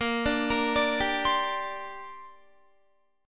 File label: Bb 6th Add 9th Bb Guitar; Bb6add9 arpeggiated; 18,17,20,19,19,19